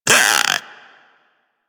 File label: Infested Splitter Split Top Vocal Pain; Warframe; Grey Strain